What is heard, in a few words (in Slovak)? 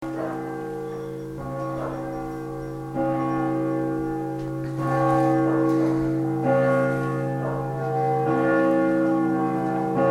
zvony